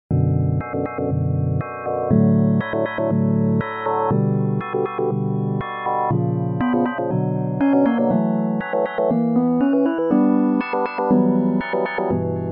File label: pad contact to spirits